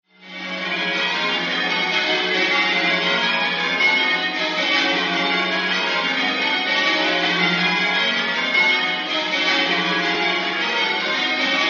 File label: Peal at Canterbury